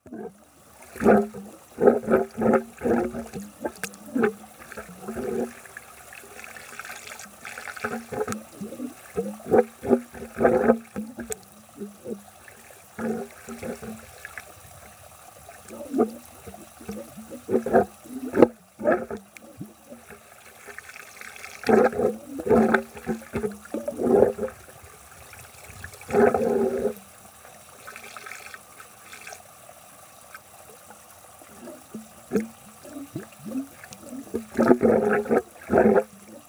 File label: Struggling Drain Hope Valley Derbyshire UK